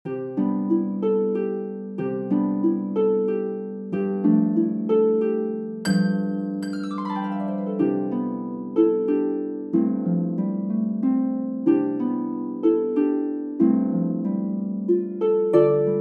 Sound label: Silent Night Lever midi